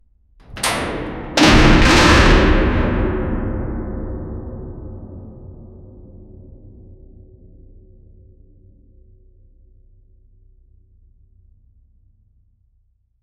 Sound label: a huge iron door closes; building